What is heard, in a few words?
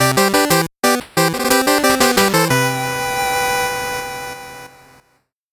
8bit jingle slow